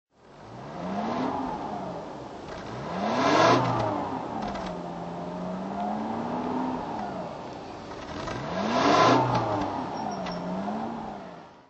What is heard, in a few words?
jaguar xj12 throttle